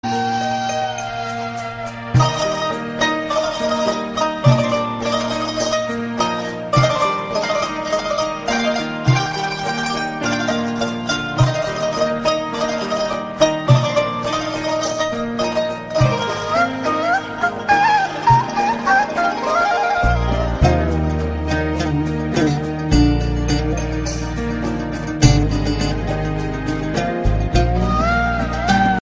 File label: arabic sad ringtone; titanic